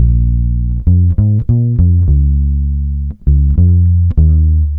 bass

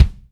ryer, Kicks Medium

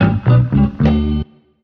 classic rock leadin

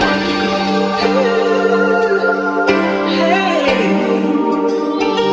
keys and voice ooh hey, Phonky loops

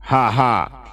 ha ha

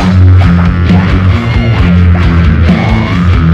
guitar thrasher, Loops

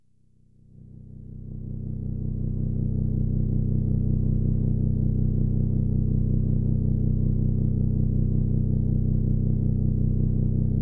single motor, Rumbles

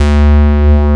yearning, Synths; Notes

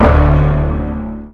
greaser, Bass

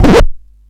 needle drop, Vinyl; Misc Percussion

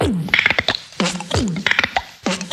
clickity clack, Beatbox; Drum loops

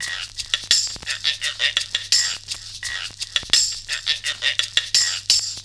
cussion, Drum loops

